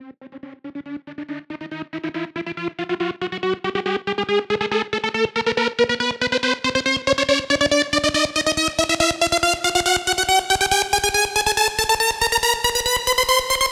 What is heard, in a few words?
Riser 140; Trap and Dubstep; SFX